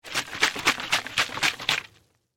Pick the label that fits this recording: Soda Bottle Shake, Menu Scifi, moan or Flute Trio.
Soda Bottle Shake